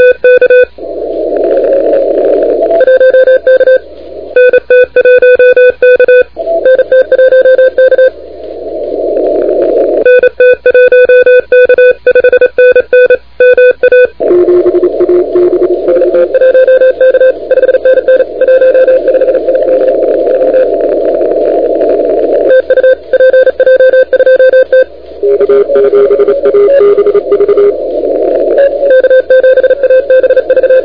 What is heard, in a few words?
CW 28MHz; ARRL10M